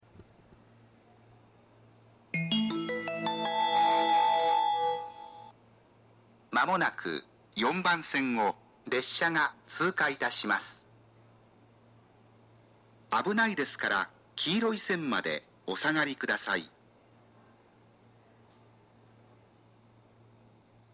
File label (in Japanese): shinjuku tsuuka; 通過放送; 新宿; 4番線; 埼京線北行(十条・赤羽・武蔵浦和・大宮・川越方面); 湘南新宿ライン北行(赤羽・大宮・熊谷・小山方面)